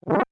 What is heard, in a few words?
Hit; Hit an enemy